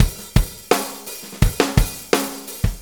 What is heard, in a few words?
Fonky, Drum Tools, Loops